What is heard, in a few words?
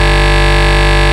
rave beep G1; Best Service Alpha Dance vol.2